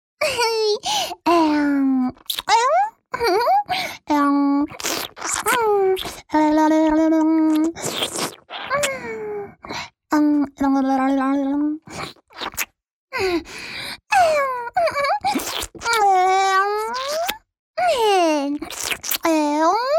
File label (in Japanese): フェラチオ 亀頭を舐める; フェラチオ(弱):３面で交代しながら亀頭を舐める